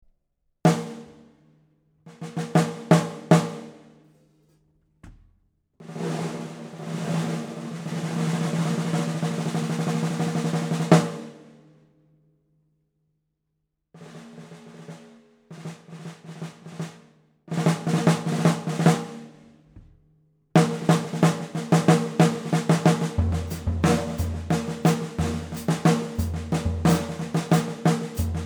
Pioneer 5x15, Ludwig

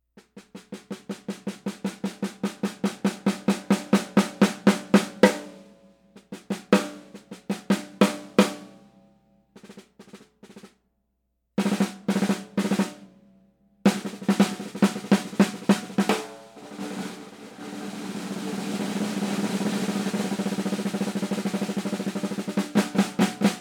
Craviotto 6.5x14 Cherry Wood Hoops

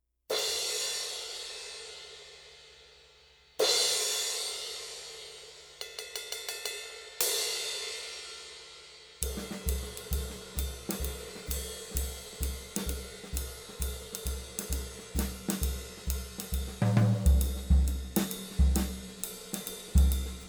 Paiste 2002

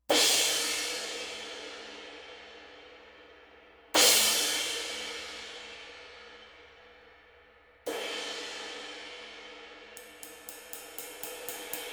22 Renaissance, Zildjian

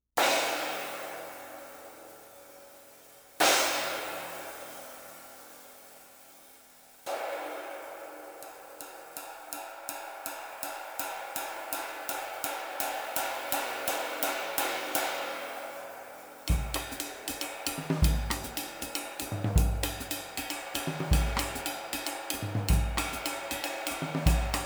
24 K Custom, Zildjian 24

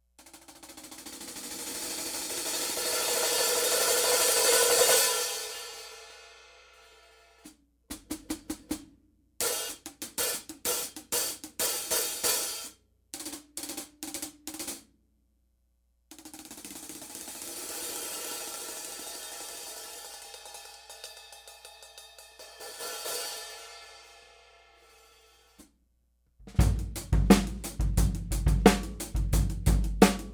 Sabian

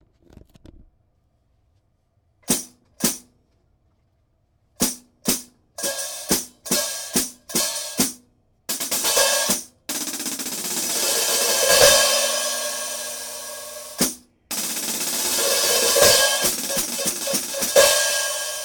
Remix Jungle HH 13; Zild

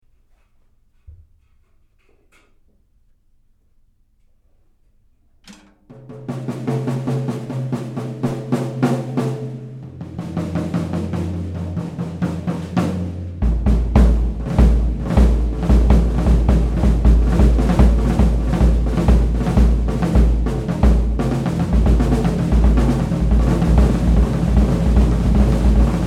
DW Classics WMP 24-13-16 Drumset

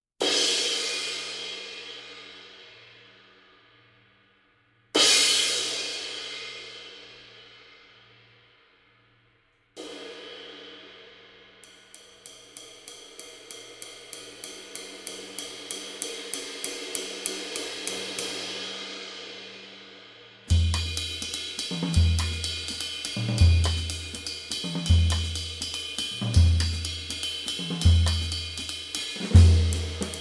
22 Sabian Artisan

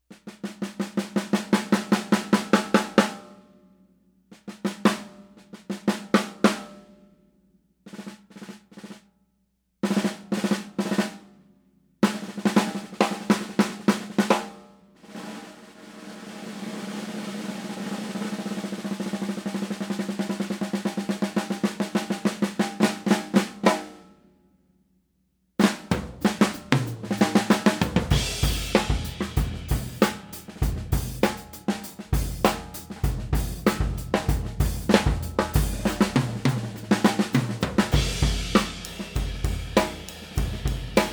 Gretsch 5.5x14 Satin USA

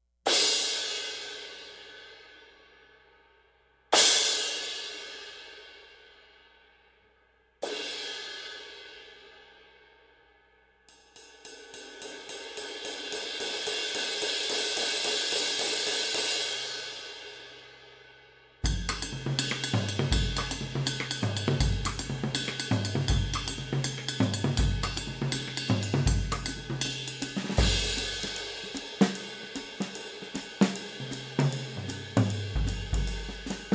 Sabian

